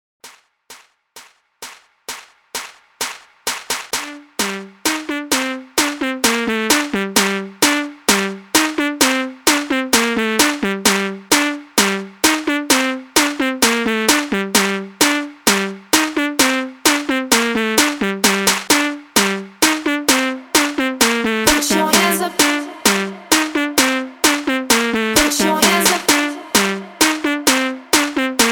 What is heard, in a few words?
PARTY STARTER 130 BPM